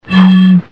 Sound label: Blow On Bottle